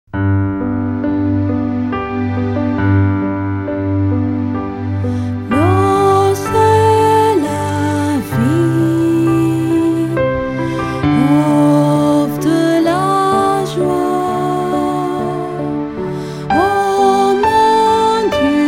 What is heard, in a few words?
water chanson music